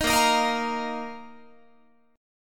A sharp Suspended 4th Guitar; A#sus4 strummed

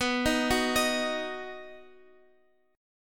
B Major B down Guitar Open F; arpeggiated; JGuitar

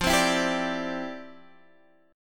C-Major Add 9th F, guitar; strummed

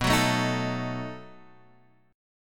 B 7th B down

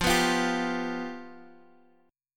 F Suspended 2nd Flat 5th, F, Guitar, Open D; 15,14,15,13,x,x

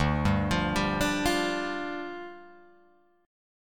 D Minor Double Flat 5th, down, Guitar, Open G

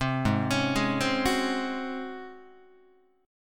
Ab 11th Ab Guitar; Ab11 arpeggiated

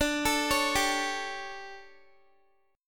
D Major 7th Suspended 4th, Guitar; DM7sus4 arpeggiated

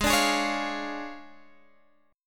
D Minor Major 7th Flat 5th, G sharp

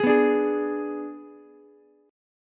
G sharp Diminished G sharp, down, Ukulele; G#dim strummed; JGuitar

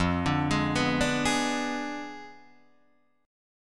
F Diminished 7th F down Guitar Open D

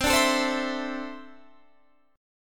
E 7th Suspended 4th Sharp 5th

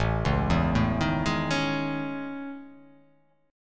A Major Sharp 11th

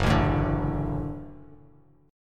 Gb Minor Sharp 5th, Bass Standard; strummed; 2,0,7,7; JGuitar

